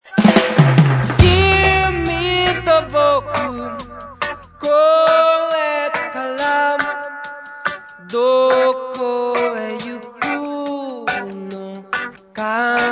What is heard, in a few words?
ohana reggae